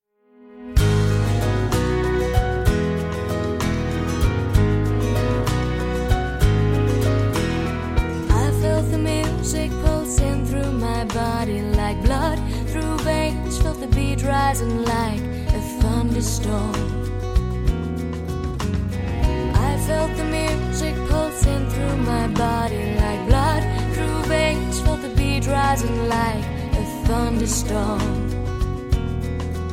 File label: Thunderstorm